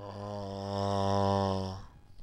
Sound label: ahh